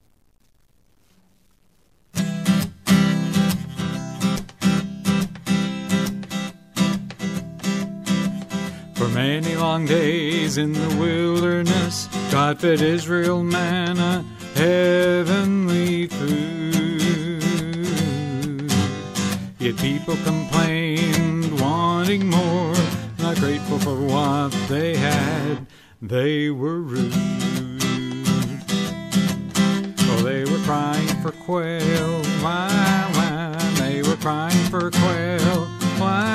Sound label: kids; gospel songs